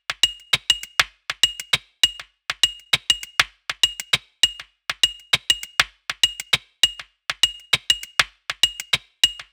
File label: Perc